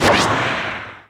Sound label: Dash; kids park kaitou sentai lupinranger vs keisatsu sentai patoranger